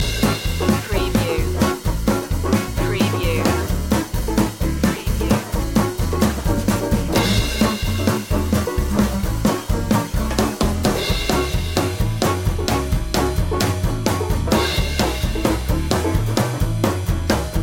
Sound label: swing loop